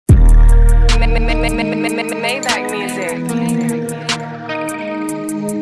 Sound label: mmmm; maybach music